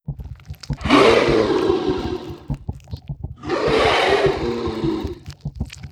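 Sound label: swarm civilian ship idle; Stellaris; other entities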